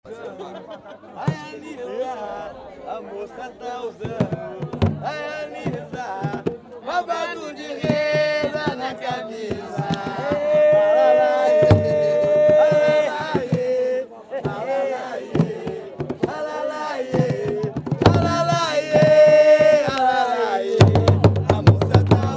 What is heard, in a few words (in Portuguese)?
jongo do tamandare saia lisa